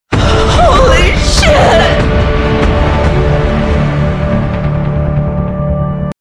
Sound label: music stinger; killstreak music; UT2K4